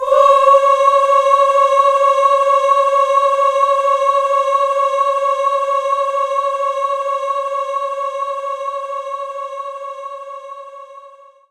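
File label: Concert Choir C sharp 5; Knights Try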